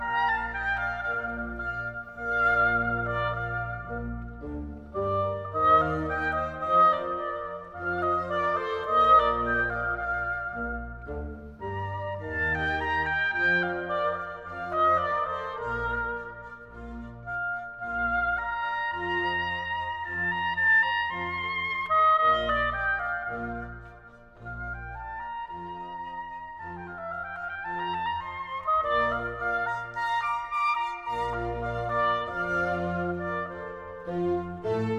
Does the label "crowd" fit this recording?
No